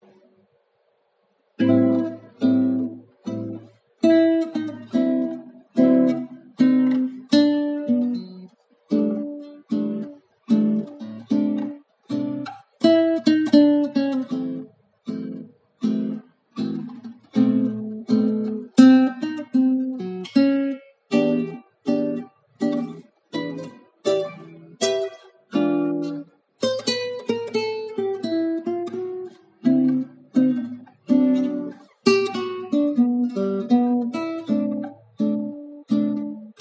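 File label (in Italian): Improvvisare con swing, chitarra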